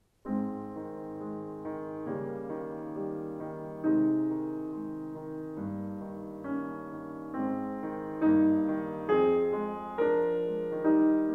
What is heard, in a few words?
Piano Sonata No. 8 in C minor; Wilhelm Kempff; Beethoven; Piano Sonatas